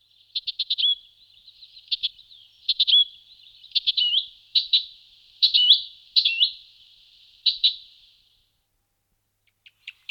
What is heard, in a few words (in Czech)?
Sedmihlasek hajni Hippolais icterina